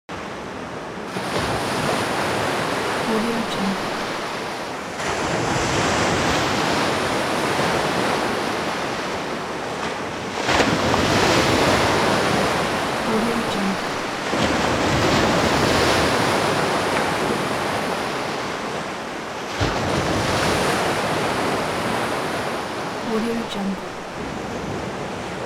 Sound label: atmo sea coast breaking waves close